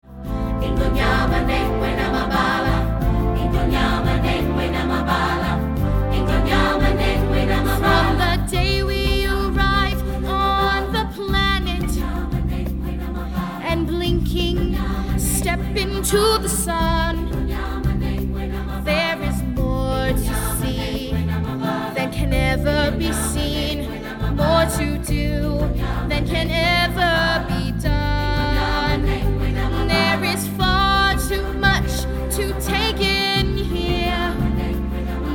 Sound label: Vocals; Rafiki